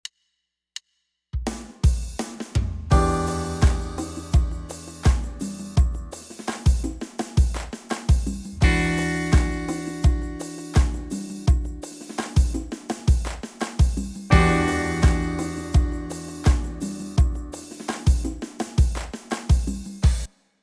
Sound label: Funk Clash; keyboards; playing well with others